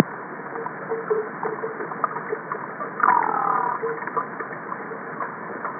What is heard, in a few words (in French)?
Son Poisson, Sein Acoustic; projet-seinacoustic; Biodiversite